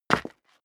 step leather shoes concrete grit single stair down; schritte